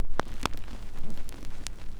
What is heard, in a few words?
SCRCH NOZ, Record Noise